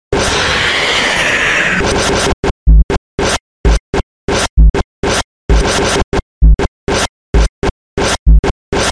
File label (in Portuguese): tiroteio funk